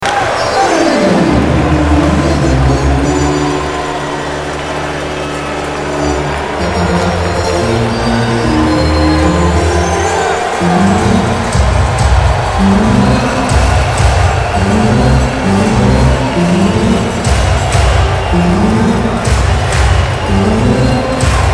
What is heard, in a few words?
ORGAN; OLYMPIC